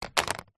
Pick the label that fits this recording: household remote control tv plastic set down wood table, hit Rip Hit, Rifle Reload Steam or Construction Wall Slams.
household remote control tv plastic set down wood table